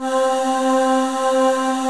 Choir Aahs

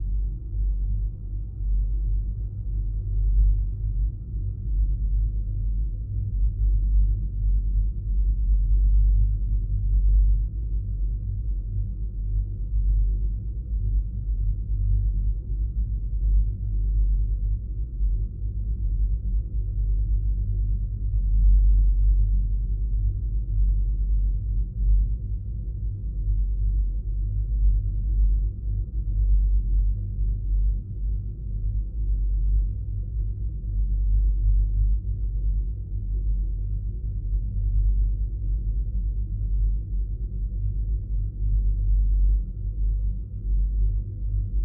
Bass Drone Subsonic Ethereal Space Tar Heater

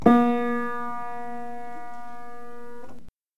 Piano

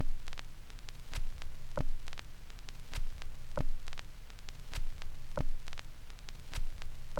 End groove Record

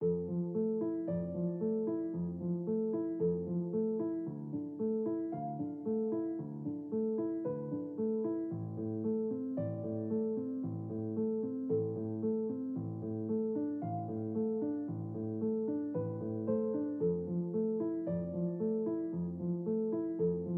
Simple Piano Melody Loop

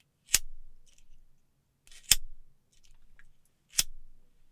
Lighter

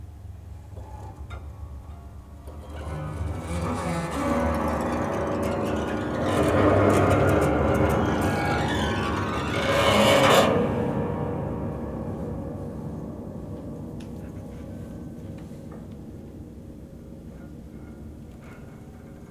Zipper Tension

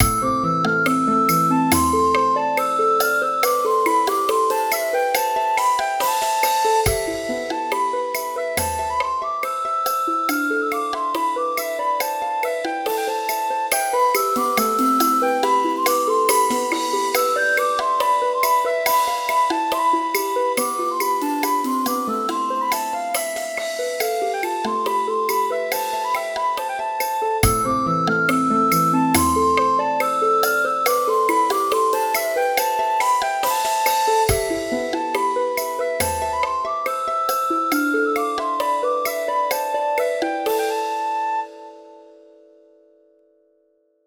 Harp Arpeggio Medieval Tune

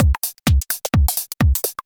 Tech style 128

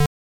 Beat

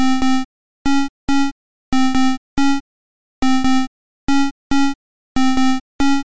C64 Synth b 140

